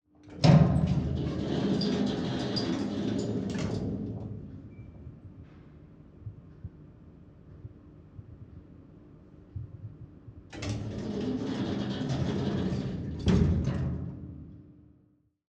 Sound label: elevator open and close